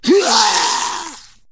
Wound Crit; Ionar

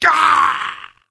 Wound Critical; Creature; Varimathras

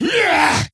attack, creature; Shadron

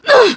Wound; Sif

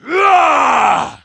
troll attack; Halazzi

